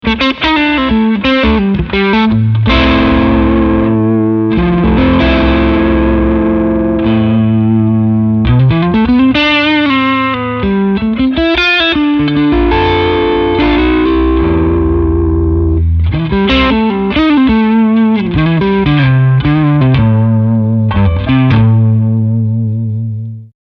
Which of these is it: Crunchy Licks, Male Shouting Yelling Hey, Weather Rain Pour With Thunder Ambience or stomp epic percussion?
Crunchy Licks